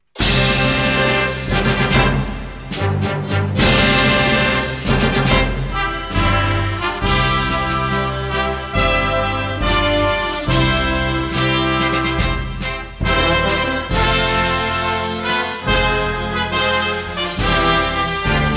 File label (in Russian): Гимн Союзного государства Духовой оркестр